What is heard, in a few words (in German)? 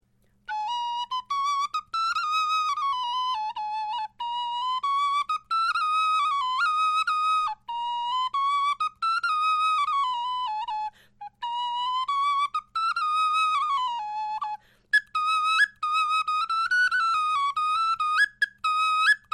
melodie